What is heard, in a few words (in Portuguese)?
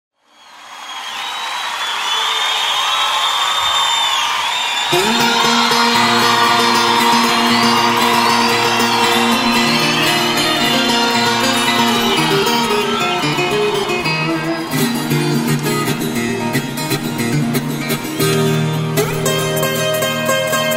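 solo de pagode, viola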